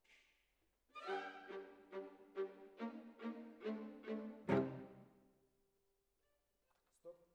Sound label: Cellos